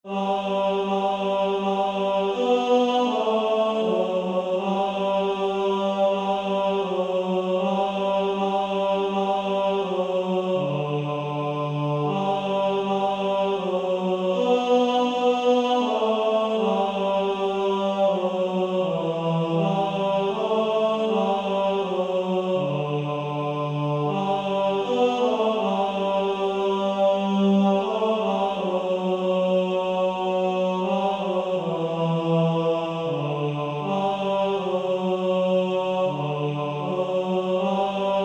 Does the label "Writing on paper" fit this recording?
No